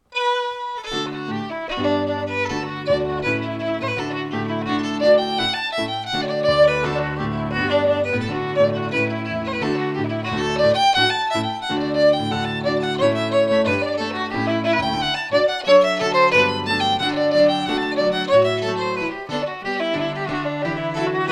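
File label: Reel, Scottish Fiddling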